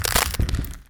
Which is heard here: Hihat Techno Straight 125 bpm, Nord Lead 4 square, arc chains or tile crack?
tile crack